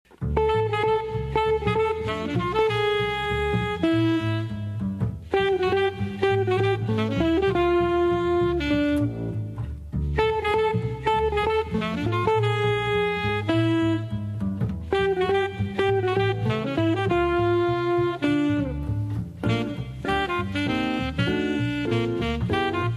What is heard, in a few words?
tune